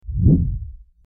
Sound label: Gentle Swoosh